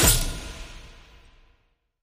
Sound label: multiplier Any; jungle spirit